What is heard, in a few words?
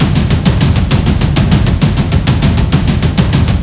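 free ambience layer, slot; silverback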